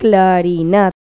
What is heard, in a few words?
clarinet